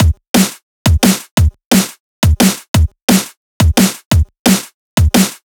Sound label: drums 175 bpm